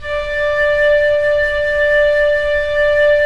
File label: FLT FL, Flute; Roland - LA Composer vol. 4